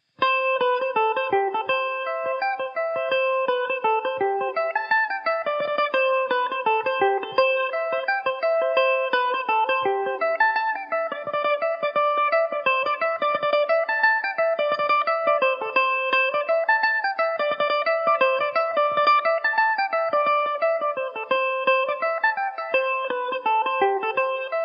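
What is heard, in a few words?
C major, Irish mandolin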